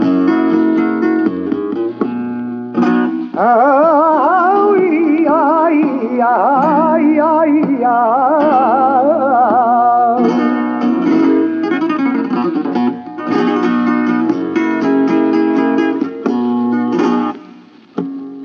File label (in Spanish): Tangos, flamenco; del Piyayo